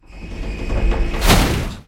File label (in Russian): priblizhayushijsya metallicheskij skrezhet